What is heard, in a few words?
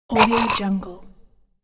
monster vocalization pain cartoons games